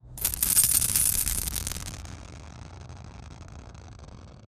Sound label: item sound effect when co, collecting